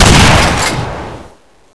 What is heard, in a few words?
Field gun; FPS-1